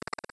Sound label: swooper no echo; smw